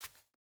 footstep burlap concrete jump start; Rust

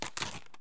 flakes open; item flakes; Escape from Tarkov

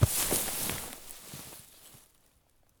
bodydrop grass low; Escape from Tarkov

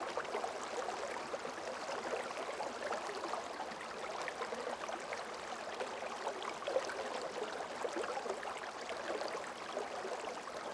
river soft; Rust

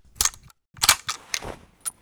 Inf Weapons Foley; Red Orchestra Ostfront 41-45

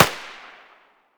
glock17 fire far; Escape from Tarkov

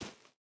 footstep barefoot dirt walk; Rust

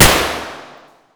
rem700 indoor silenced distant; Escape from Tarkov

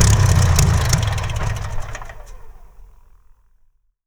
Aircrafts Engines Rolls Royce Merlin Shutdown; DCS

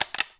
Rifle Cock; Civ4 warlords

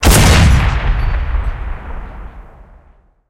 cannon 85mm ZiS C53 shot

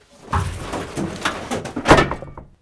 Vehicle Hatches; Red Orchestra Ostfront 41-45

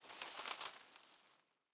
leaf rustle twiggy med

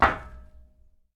run metal; Escape from Tarkov

